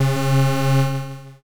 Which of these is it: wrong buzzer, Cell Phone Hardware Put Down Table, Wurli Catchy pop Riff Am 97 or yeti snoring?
wrong buzzer